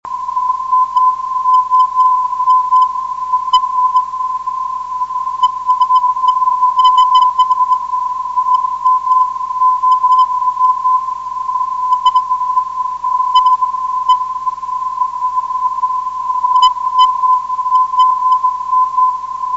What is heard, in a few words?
351kkz